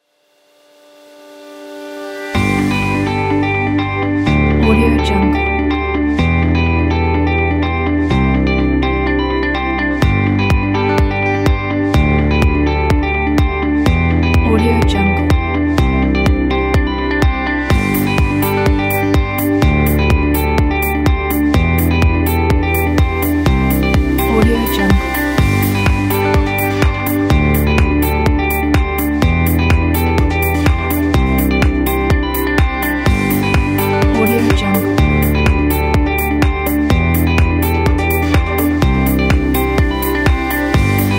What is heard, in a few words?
background music